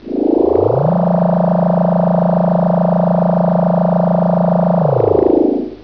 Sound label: Tardis Doors